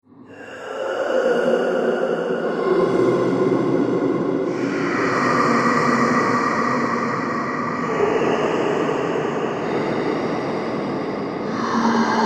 Sinister Breaths Horror, Scary Breathing; Scary Breathing Sound Effects

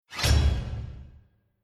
Stinger Impact Sword Metal Jail Door; Sound Design; Stingers; Tom Hutchings

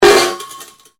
METAL PIPE IMPACT; Metal Sound Effects; Metal (Sound Effects:Materials)

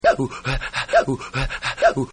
Slow Yodel; Singing; Voice; Partners In Rhyme

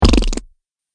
Mud Splat; Slimy Sound Effects; sound design sound effects

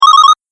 Pickup App Game; Video Game Sound Effects

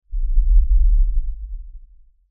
LFE Ultra LFE Hit, Lfe Ultra Low Bass Hit Impact; Deep Tones; Sound Design; Blastwave

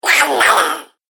Horror Gremlin, monster

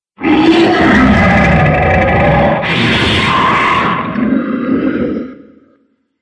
Demon Of Distortion, Monster Sound Effects; horror

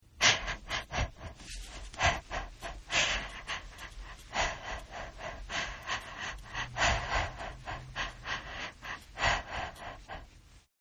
Breath Winded Female; Breath Veryslow Female; Breathing Sound Effects; Human

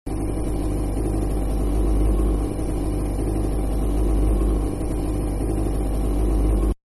Dentist-White Light Dryer; Machinery; Machines

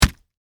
Punch Body, Impact; Punches and Hits; Sound Effects:Combat

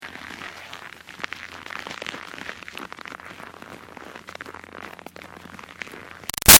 Car Tire Snow Driveway Creak; Tire Move; Cars; Vehicles

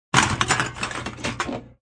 Rummaging through cans

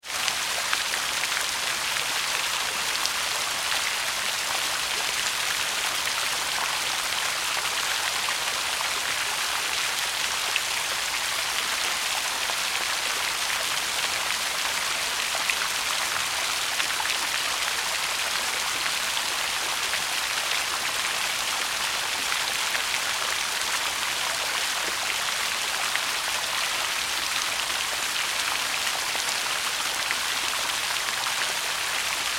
Rain on Water Splashy; Weather; Tom Hutchings

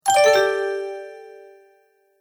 Multimedia Tone-Return/Back

